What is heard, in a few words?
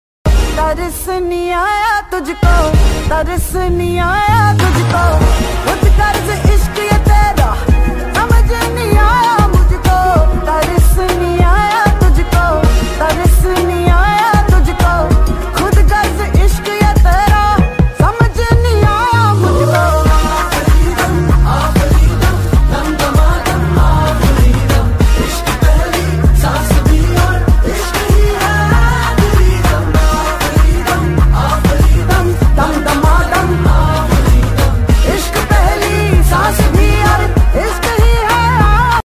new song ringtone; Munjya; Sharvari